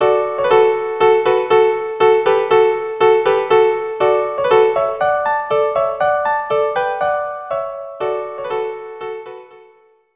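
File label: Dance viola duo; Dance of Tumblers; Snow Maid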